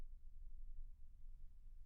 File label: Children Choir; Studio Bekol Children Choir